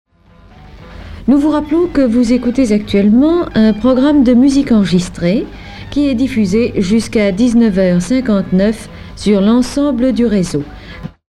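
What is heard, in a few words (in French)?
Jingle Musique enregistrée SHORT; Anti Jingles et Curiosites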